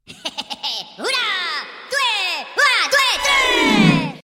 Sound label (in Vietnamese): nhac chuong minion lam cho cuoi